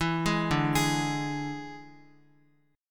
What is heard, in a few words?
D Suspended 2nd Flat 5th D Guitar; Listen to Dsus2b5 arpeggiated; JGuitar